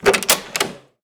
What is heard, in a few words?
door open